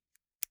object electronics insert xlr extension latch slow